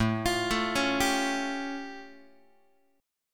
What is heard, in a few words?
A Augmented 7th A Guitar; arpeggiated; x,5,8,7,5,6; JGuitar